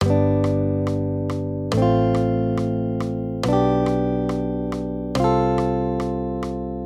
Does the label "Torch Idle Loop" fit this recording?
No